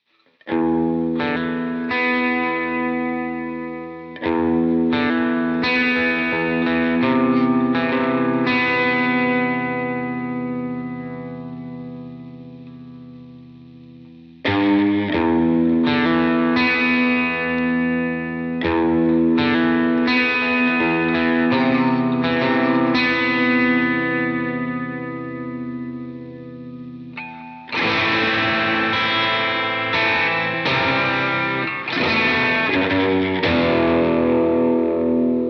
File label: Texas Toaster Treble Mode